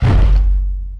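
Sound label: wood hit large; objects; Star Wars Obi-Wan; XBOX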